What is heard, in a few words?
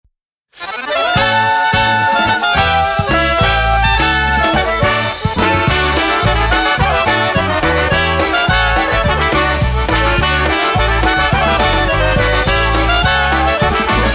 Krakowiak